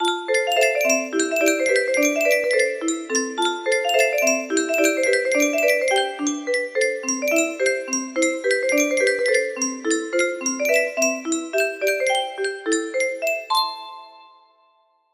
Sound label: melody